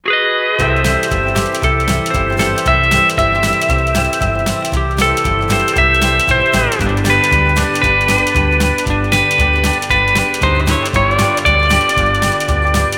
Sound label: Fast Hawaiian